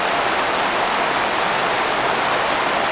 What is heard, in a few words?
Medium Crowd Clap; David Beckham Soccer; XBOX